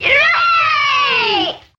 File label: "yay", kids cheer; ooh ahh